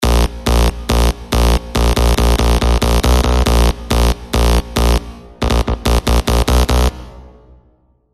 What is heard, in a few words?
KNARZ BASS